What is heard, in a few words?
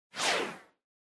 Motion Speed Lines Short